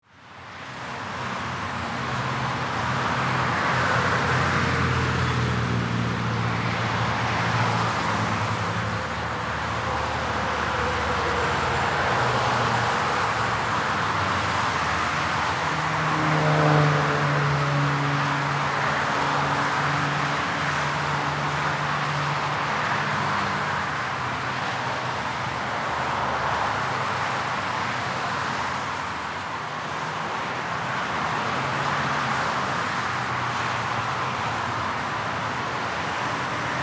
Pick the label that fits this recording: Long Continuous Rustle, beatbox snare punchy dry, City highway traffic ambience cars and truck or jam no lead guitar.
City highway traffic ambience cars and truck